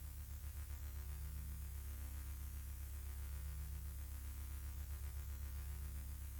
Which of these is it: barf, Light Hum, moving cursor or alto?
Light Hum